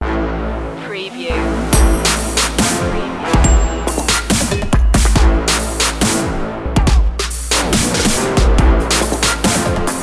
no vocal fx

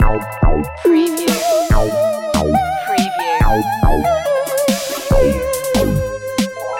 alien pets loop

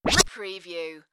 Turntable Scratching

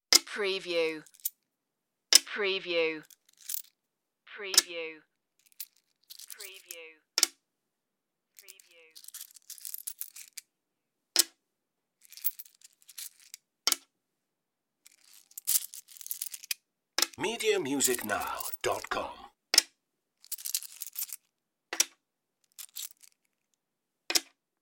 put single coins on table

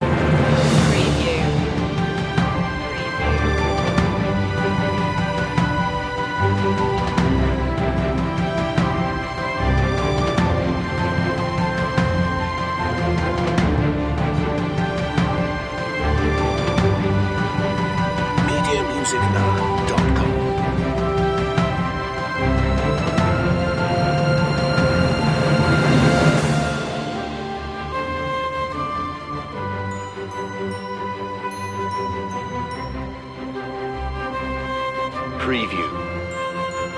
alt perc; media music now

